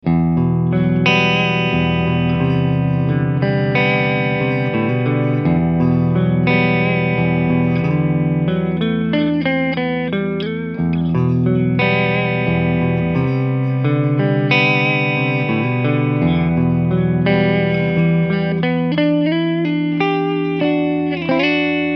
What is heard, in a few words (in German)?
Beide Humbucker Clean Picki